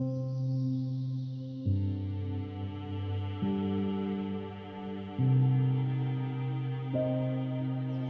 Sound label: galaxy j7 plus sms